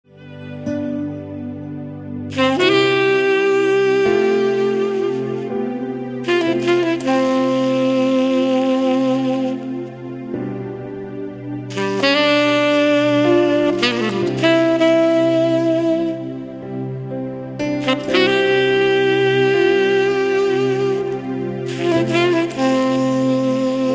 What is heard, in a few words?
smooth saxophone chill